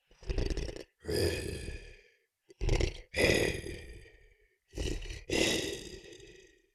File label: Cartoon lion voice breathing